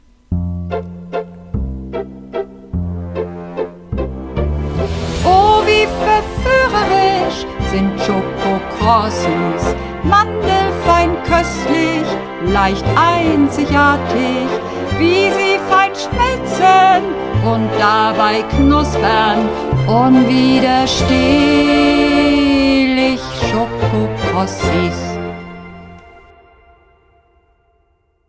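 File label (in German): Werbemusik, Bass Frauen Männer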